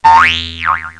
Sproing

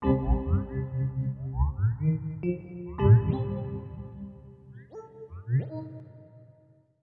SYNTH D+B 160 C, Drum+Bass Keys; eJay

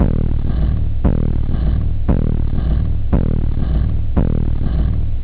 flam, drums technobd

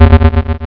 Trap; Catacombs

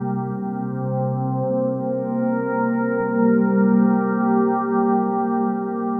synth pad ambient 160 C; Sphere; eJay

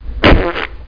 splatted; How Many Bugs in a Box; juicebar

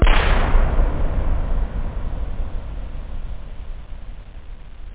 slam; da capo vol1; instruments

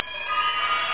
chimes; da capo vol1; instruments; synth

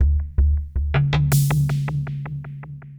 PERCUSSN, Ambient, Drum

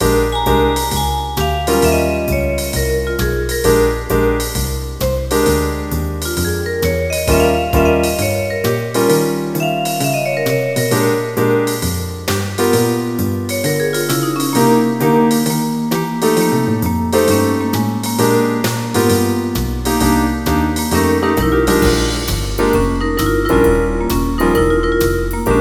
blues

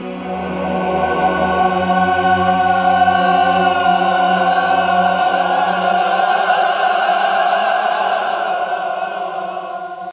INTR CHOR, VOCALS

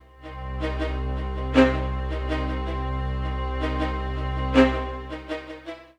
string, Drum+Bass, 160, C; eJay

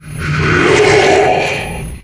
boss taunt

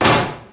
BIKE HIT

